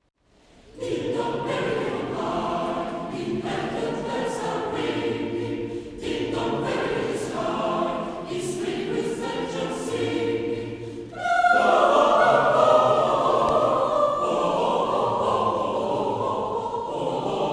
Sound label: Ding dong merrely on high